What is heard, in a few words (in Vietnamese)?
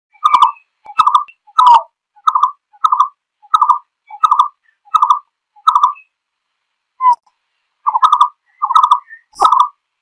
tiếng chim phướn kêu gọi bầy